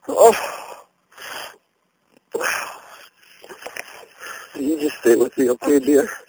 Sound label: EVP